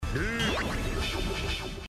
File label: effect fire